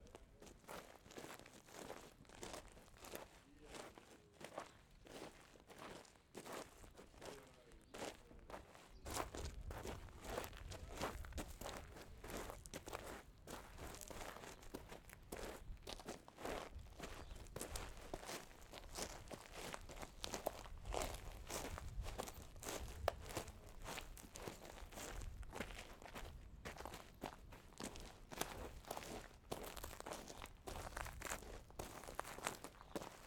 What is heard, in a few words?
People Hiking